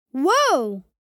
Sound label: Excited vocalization boy kid child